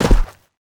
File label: land sand, footsteps